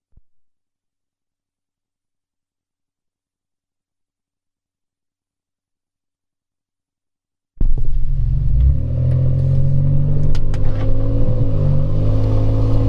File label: exhaust; bluetrane flo pro